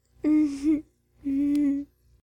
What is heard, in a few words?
Cuddle; Bedroom; Hilzartov; Fairy Size Doesn't Matter